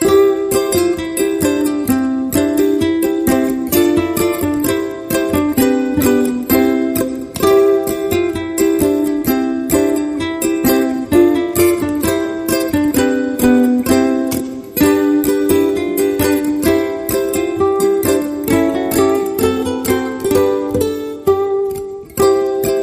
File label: Deck The Halls Ukulele